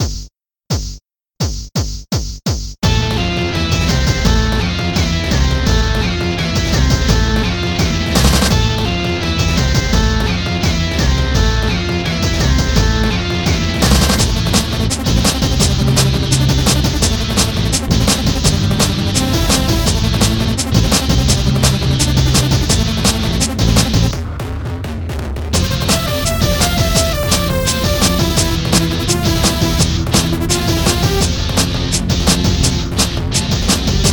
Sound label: The fast instrumental censored remix